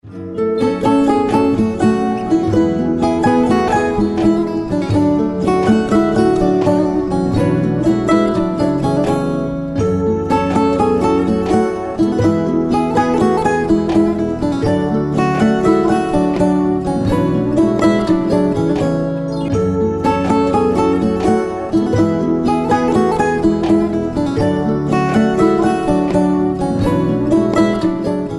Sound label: turkish music ringtone; android